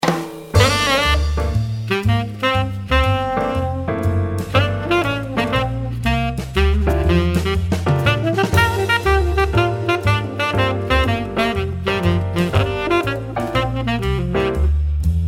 Soloist Tenor Jazz; gottsu

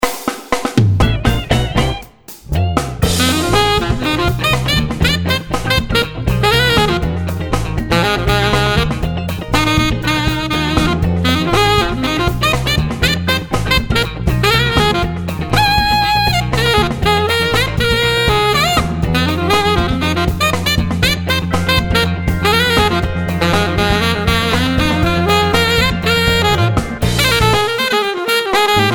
Custom Alto Funk; gottsu

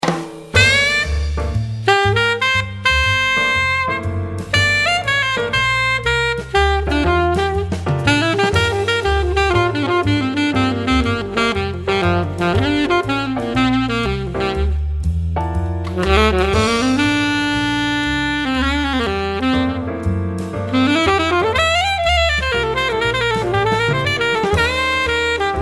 Alto Soloist Jazz; gottsu

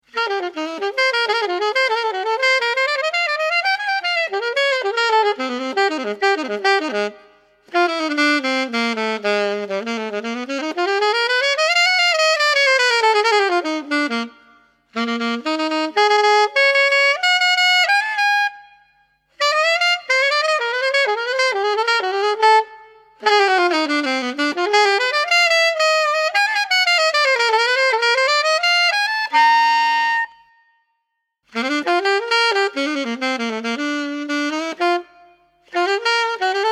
Jazz Metal Alto

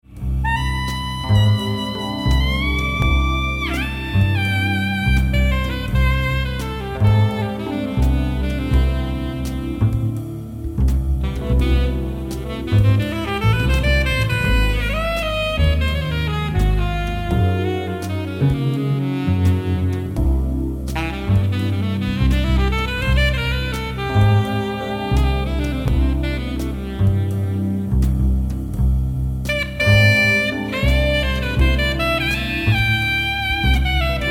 Song Alto; Gotsu Sepia

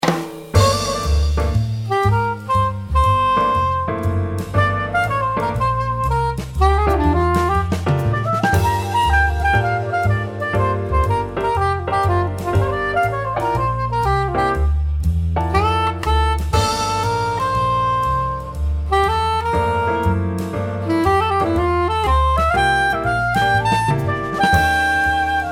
Soloist Sop Jazz

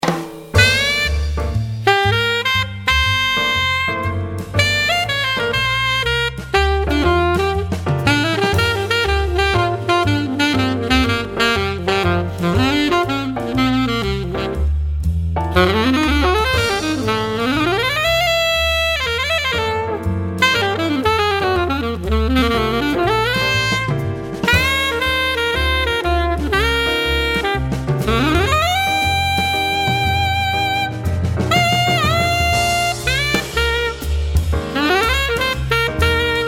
Alto Jazz; Sepia Tone VI Alto; Jazz Soloist Alto; Jazz Metal Alto; Sepia ToneVI Marble Alto; Metal HL Alto

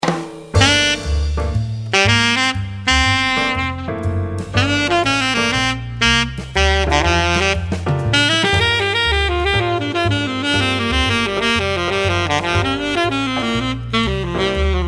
Jazz Metal Alto; gottsu

